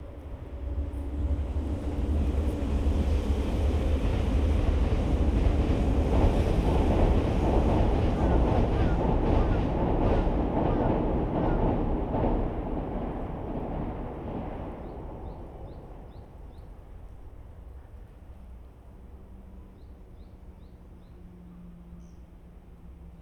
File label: Binaural